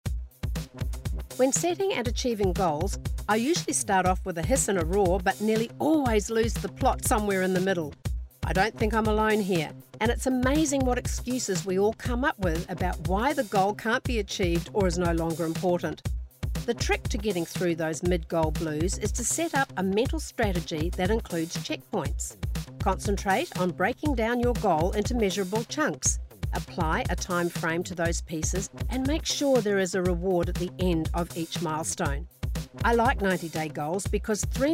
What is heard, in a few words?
blues